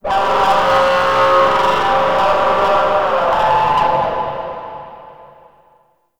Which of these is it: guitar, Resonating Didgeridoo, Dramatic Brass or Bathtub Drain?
Resonating Didgeridoo